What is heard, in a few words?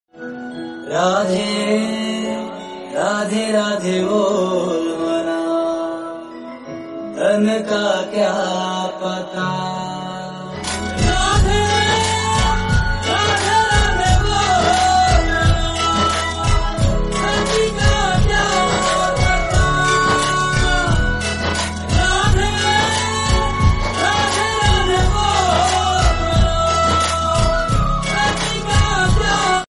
Devotional Hindi Ringtone